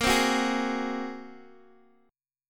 Bb 7th Suspended 2nd Sharp 5th Bb down Guitar Open D